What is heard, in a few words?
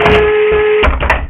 cannon move; gesource; blockfort torn